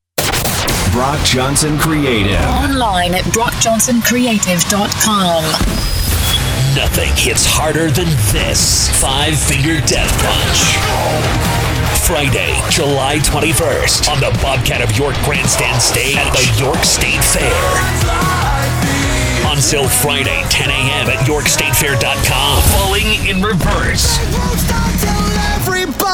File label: CONCERT ACTIVE ROCK; BROCJOHNSONCREATIVE